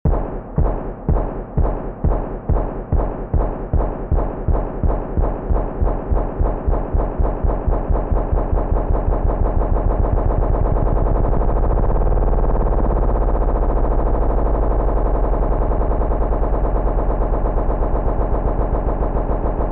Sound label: Noise